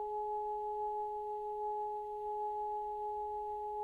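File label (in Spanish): Multiarmonicos, saxofón multifónico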